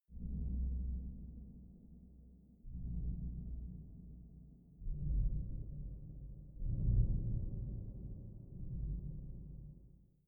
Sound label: space unknown huge species growl call; horror